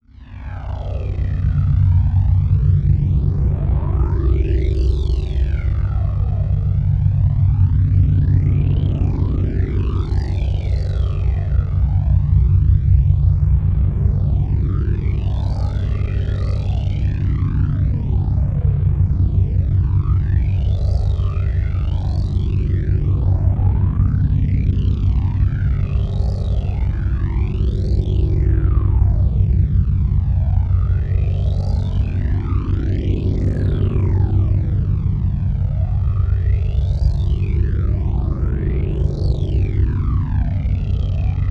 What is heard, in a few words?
Drone Flange Square Wave Vortex; Production Element Imaging; AdobeCC